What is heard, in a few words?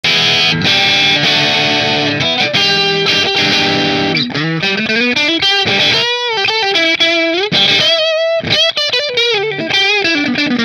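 Nola Voodoo humbucker middle marshall, guitars; New Orleans Guitars Voodoo Custom Redwood Top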